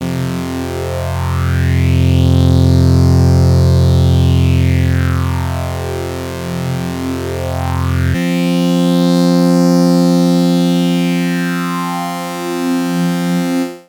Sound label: prodigy stereo polyphase